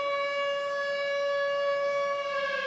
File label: Alarm Ring